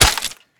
ground, wpn

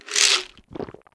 small medkit

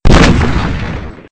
shotgun fire

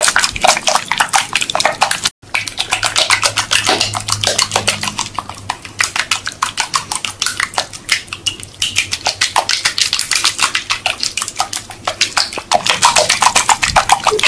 wet slapping noises; sven

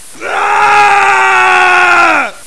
Die; USEC

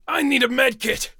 wound; darkwing

